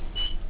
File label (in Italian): pulsante SCMT, train; Aln501; OpenBVE